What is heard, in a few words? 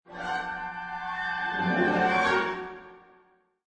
Orch Cur; Orchestra plays romantic sounding passage; Orchestral; Musical